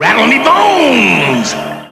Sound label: rattle